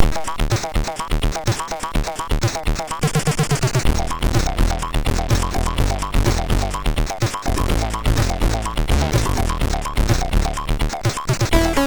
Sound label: Old Chaps, MOS6581R3